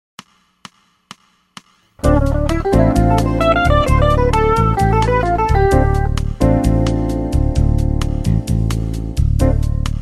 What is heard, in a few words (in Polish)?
podklad do cwiczen improwizacji